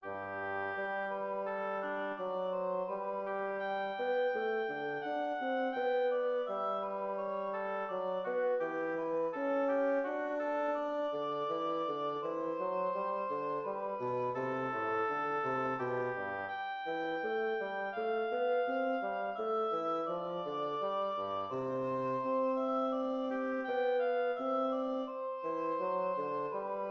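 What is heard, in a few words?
fugue in G major oboe bassoon; handel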